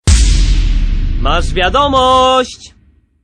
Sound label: bach sms ringtone